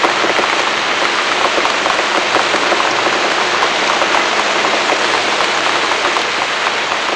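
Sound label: rain wind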